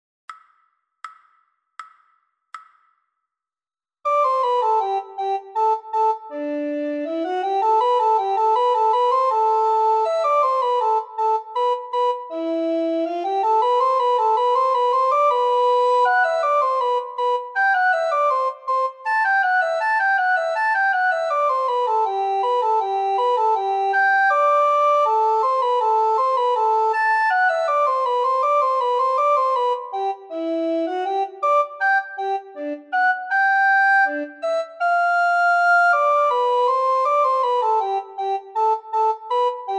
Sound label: descant, recorder